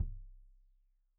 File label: Kik Stereo; Deeply Sampled Drums